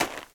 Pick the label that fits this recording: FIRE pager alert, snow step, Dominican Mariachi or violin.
snow step